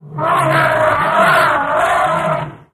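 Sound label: Elephant Baby Trumpet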